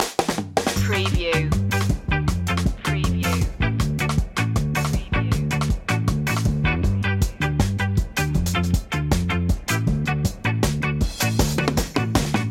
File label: ska no horns or organ